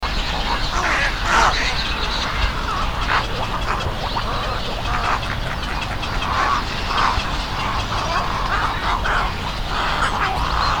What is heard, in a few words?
Dimorphic egret